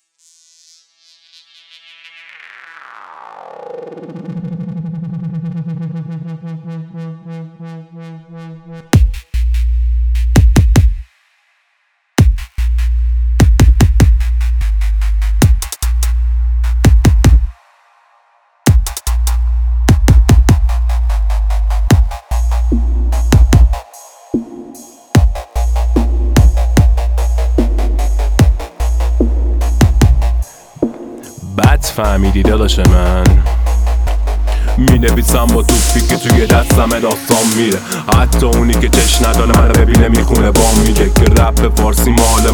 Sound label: Vocals, Trap Step